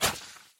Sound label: xen infest plant bulb; infestation; Half Life Alyx